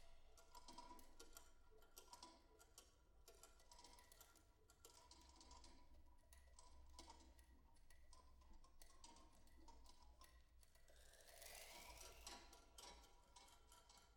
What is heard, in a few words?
concert ambisonics